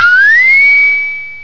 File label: PING LONG; EFFECTS